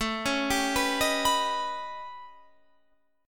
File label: A 9th Flat 5th Guitar Half Step Down, chord; A9b5